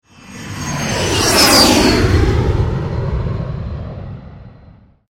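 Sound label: supersonic jet plane flyby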